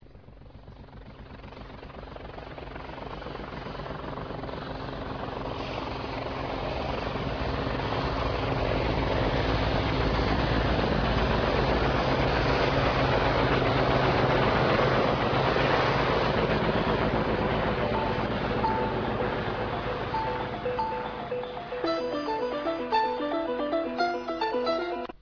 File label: helicopter